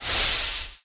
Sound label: lava; Gish